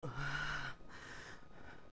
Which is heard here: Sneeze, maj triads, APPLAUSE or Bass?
Sneeze